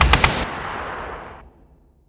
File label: gun; groundwar